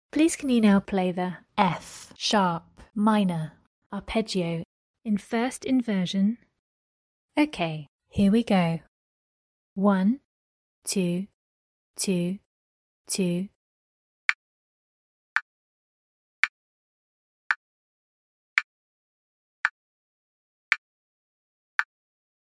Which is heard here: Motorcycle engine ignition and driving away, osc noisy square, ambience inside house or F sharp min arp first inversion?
F sharp min arp first inversion